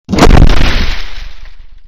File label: attack; pp4 pianosaurus evo2